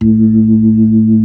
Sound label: B-3, Club